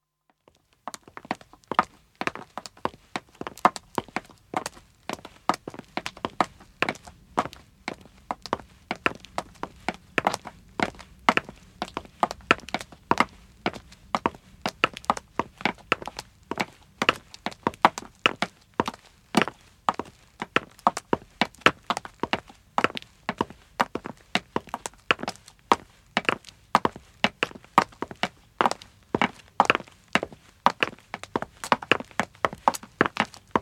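Footstep pavement walk, 1 man 1 woman start and stop; BBC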